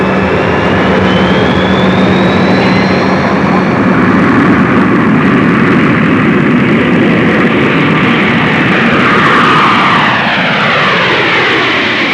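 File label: takeoff, Transport; Sound Library 2000; Wayzata Technology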